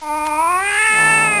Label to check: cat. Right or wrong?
Right